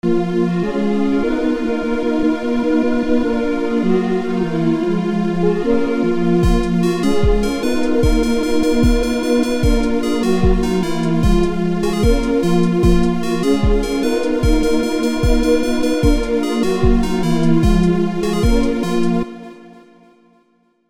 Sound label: locrian; attempt to write an okay-sounding melody in Locrian mode